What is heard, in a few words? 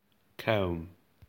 comb